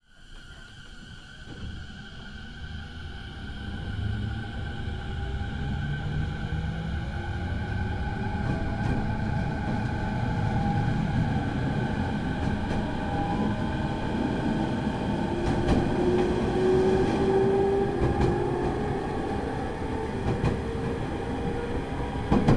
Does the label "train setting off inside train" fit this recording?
Yes